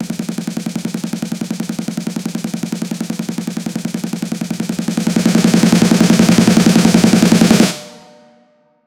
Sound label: drums